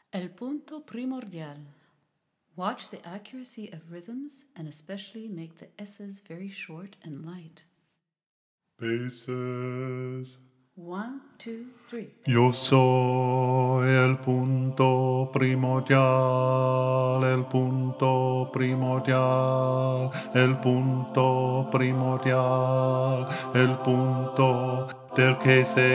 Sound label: bass, choral music